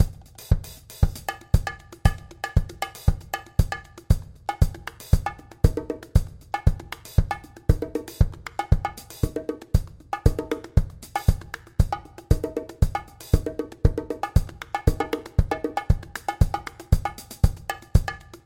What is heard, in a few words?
menu; escape the fuzz